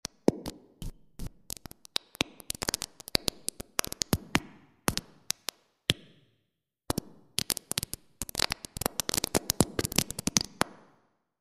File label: Small hall dark; .mp3 file - 100% wet; freeverb